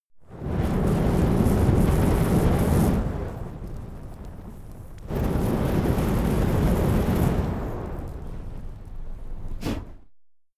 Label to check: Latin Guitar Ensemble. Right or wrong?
Wrong